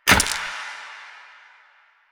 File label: Dice Passive Slot Switch; Warframe; Koumei